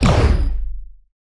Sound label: laser; spacemap; lasers